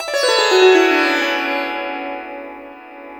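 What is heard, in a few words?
sitar glissando; Spectrasonics; Heart of Asia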